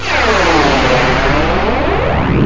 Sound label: BEAMDOWN; eXtended Instrument